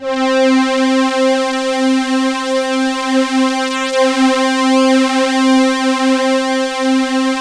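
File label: C4 big orchestra; Sound Gallery - Charged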